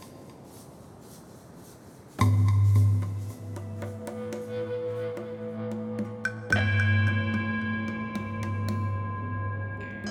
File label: quiet; Noita